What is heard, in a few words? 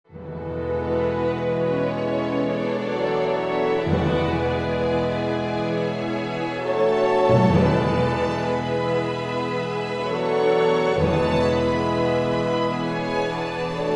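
symphony orchestra Kazakh folk instruments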